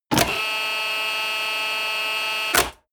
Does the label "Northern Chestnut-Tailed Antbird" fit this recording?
No